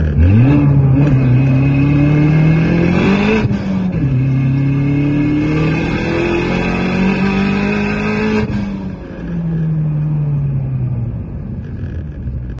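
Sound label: good exhaust set up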